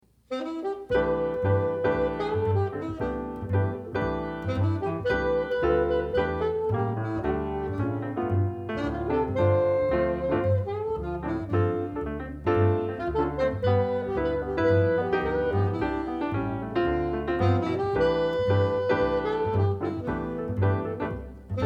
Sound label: jazz samba trio